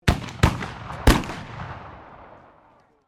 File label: MUSKETS CAPLOCK 45 CAL HEAVY CARTRIDGE FIRING TRIPLE, at a shooting range; Gun Shot; Black Powder firearms at a shooting range